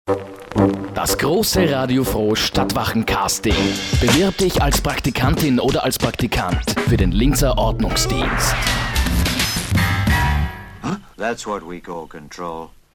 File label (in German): jingle